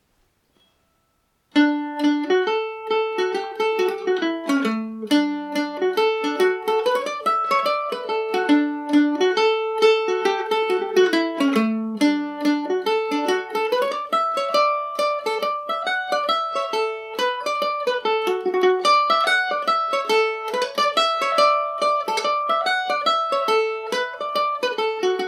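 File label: boys of knock D major, mandolin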